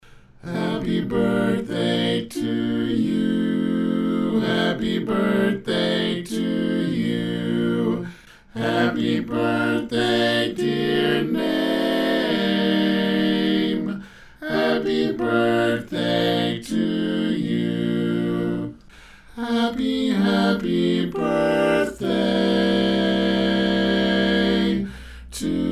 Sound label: All Parts, barbershop